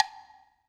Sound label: WOOD BLOC, HR-16 Drum Mashines; EdgeSounds